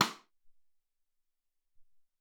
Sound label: Rim, Alesis SR-18 Drum Machines; 300 Drum Machines